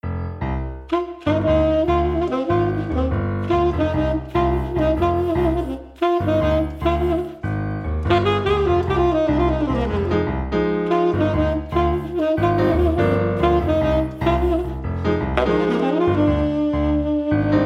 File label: latin jazz